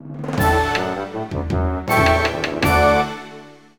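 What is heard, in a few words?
Jng Taiko, Jingle Taiko; nodame cantabile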